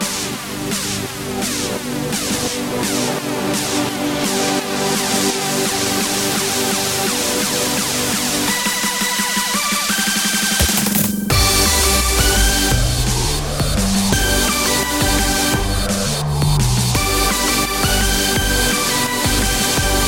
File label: active energy ringtone